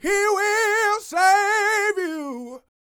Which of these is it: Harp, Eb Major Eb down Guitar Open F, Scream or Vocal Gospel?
Vocal Gospel